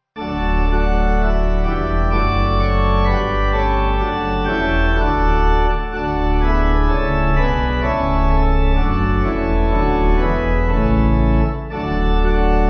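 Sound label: Pipe Organ, Music Backing Files for Churches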